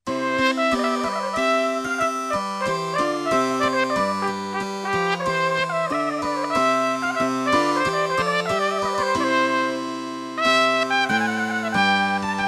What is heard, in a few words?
Trumpet Voluntary